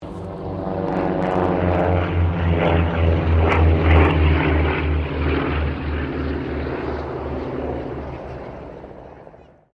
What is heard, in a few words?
AIRCRAFT UNKNOWN PROPELLAR SQUADRAN PASS